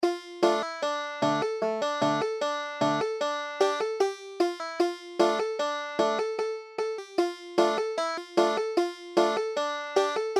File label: belzer nigun, banjo